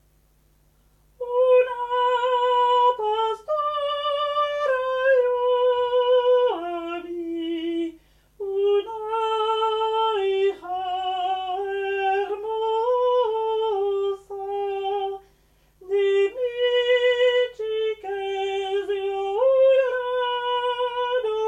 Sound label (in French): chant